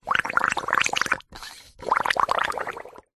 bicycle pump in shallow water suck release bubbles; zapsplat